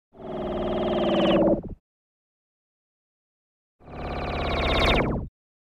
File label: Descending Sound waves; 2 Various Oscillating Descending Tones. To Low Frequency.; Synth Tones